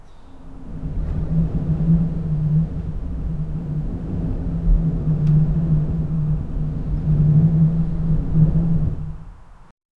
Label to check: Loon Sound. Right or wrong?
Wrong